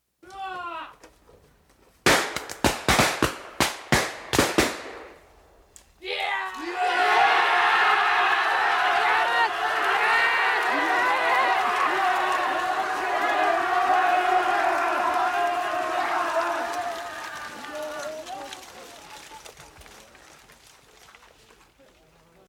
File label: japan war-cry gun